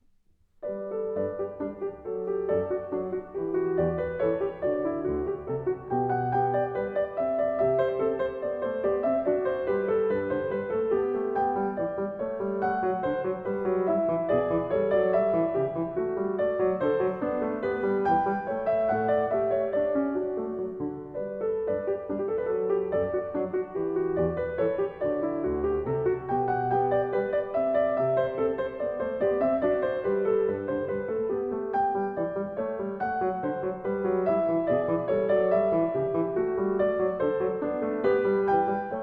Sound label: Goldberg Variations, BWV 988 - Var. 19 a 1 Clav.